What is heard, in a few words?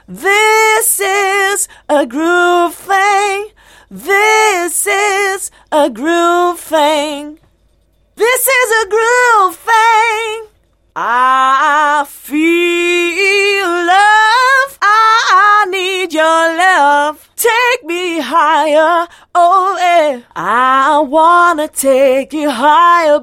house music vox